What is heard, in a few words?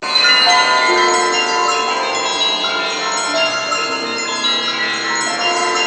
A#3 dream, belltasy; Northstar; The Wizard VOL-1